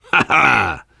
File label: laughter, survivor voice biker